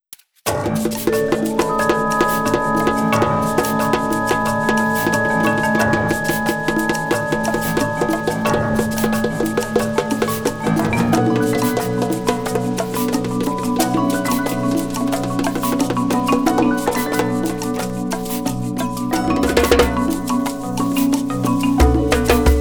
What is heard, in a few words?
djembe; muletone audio